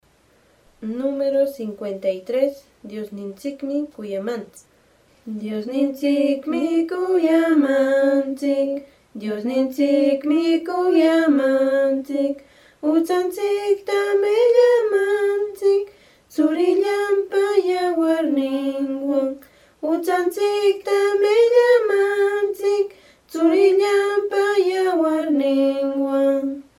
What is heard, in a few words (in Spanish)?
cancion